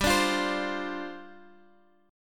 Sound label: D-Minor 7th, G